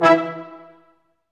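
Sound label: BRS, Brass Staccatos, Brass Ensemble; Roland L-CD702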